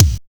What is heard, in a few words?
HOT KICK; Drums In Your FACE!